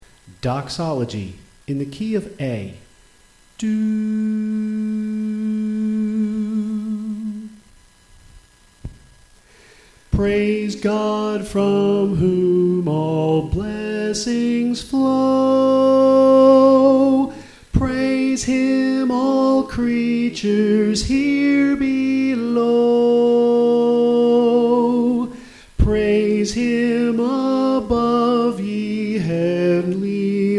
Soprano

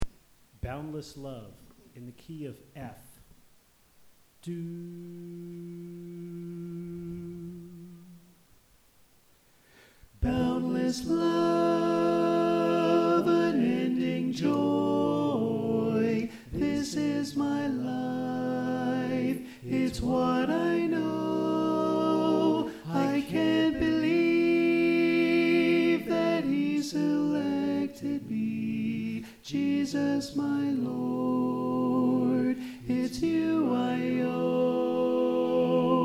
Alto

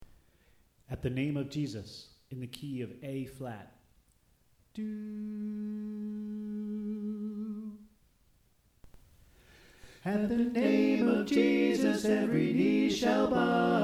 Tenor Left

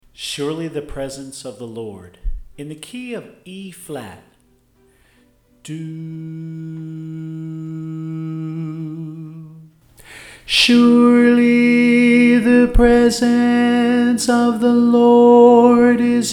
Soprano

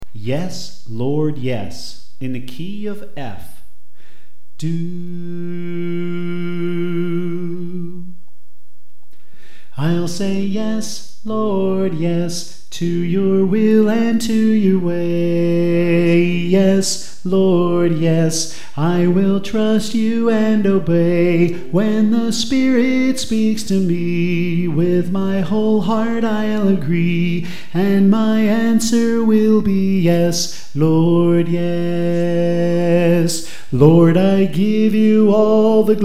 Soprano

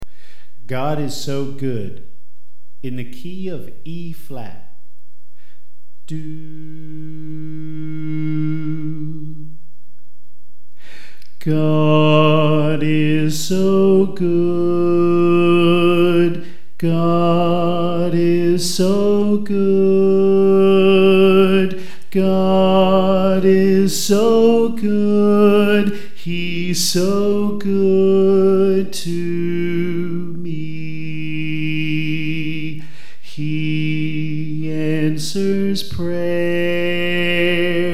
Soprano

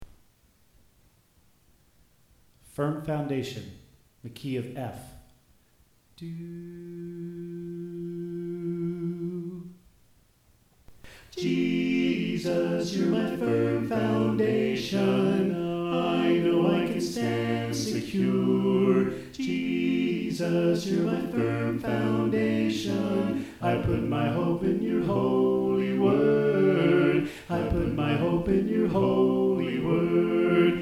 Soprano Left

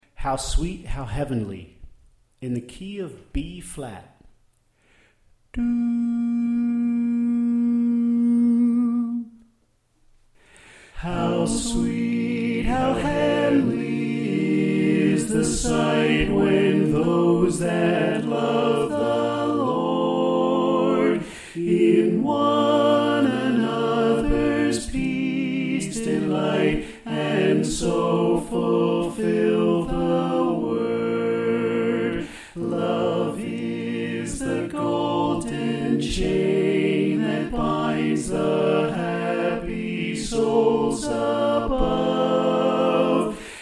Tenor Left

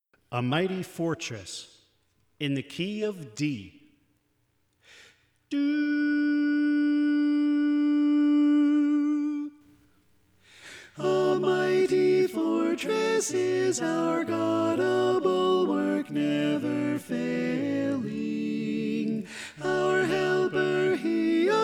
Alto; A Mighty Fortress

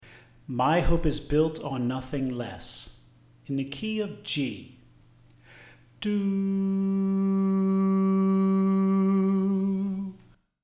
Soprano

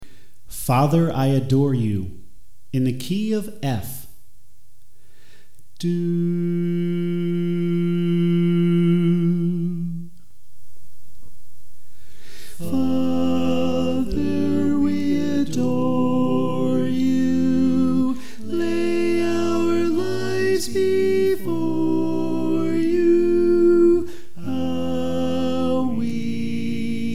Alto

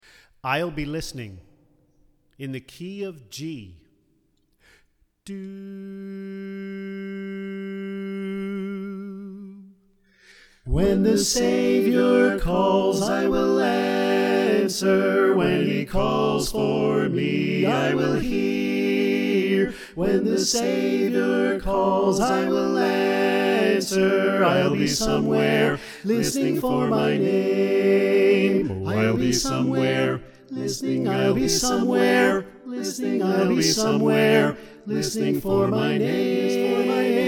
Equal Mix

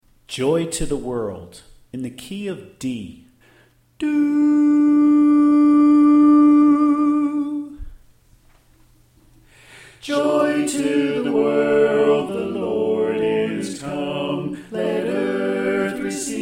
Tenor Left

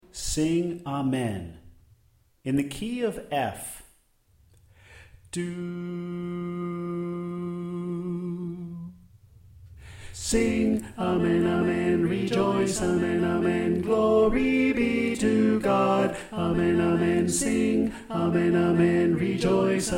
Soprano Left

